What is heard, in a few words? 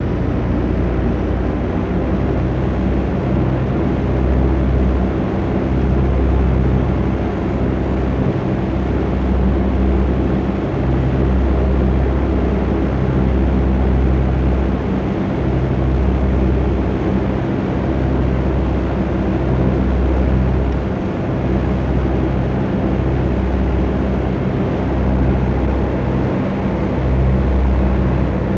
B17 Int Loop, flying fortress; B-17 Interior Loop, Mono